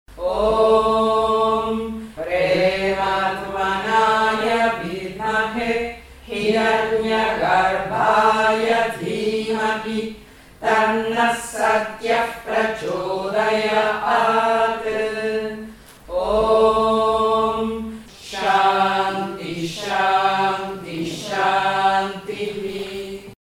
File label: Hiranyagarbha Gayatri